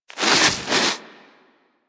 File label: Armadillo Roll Snow; Warframe; Hunting Critters; Foley